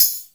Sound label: TAMB. PITCH; Back In Time Records; Big Bang; THE CONSTANT